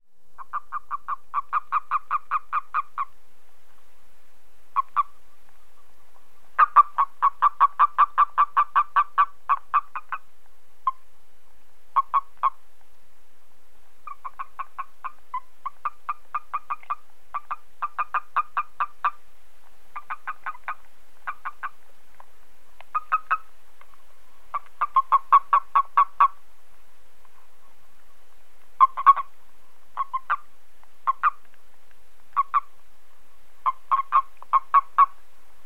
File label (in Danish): skrubtudse